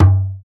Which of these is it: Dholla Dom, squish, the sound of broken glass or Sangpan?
Dholla Dom